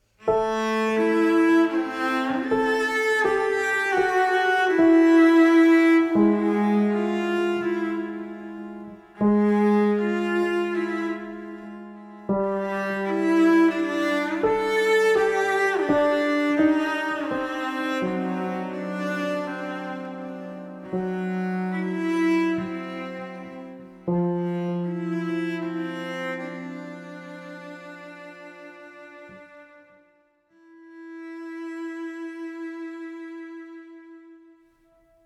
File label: Piano Cello Only